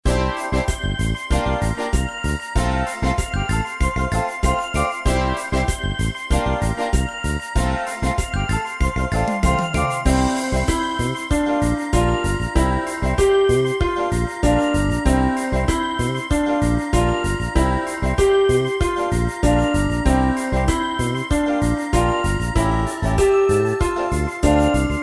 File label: Keyboard jogging Disco; pistas-piano